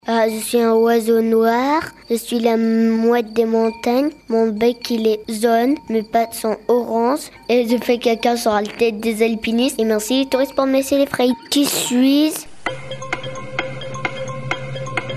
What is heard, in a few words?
Storm choucas; Houches; Globule Radio